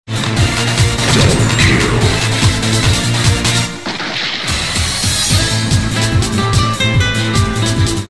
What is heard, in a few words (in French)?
Accordeon